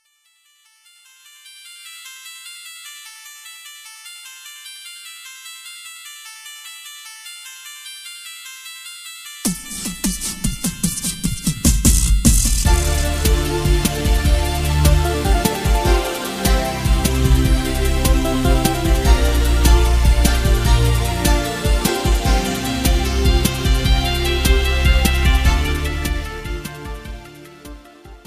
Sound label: Tune; Rambo 3